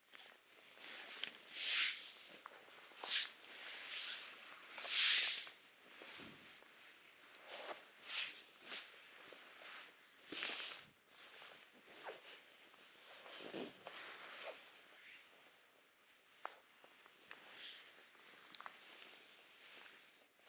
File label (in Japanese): 布団をかぶる左